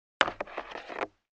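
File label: Mech Wood Object Move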